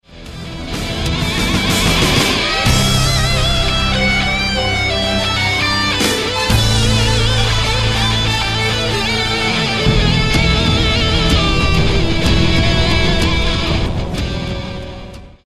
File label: guitar; cstrike; infotex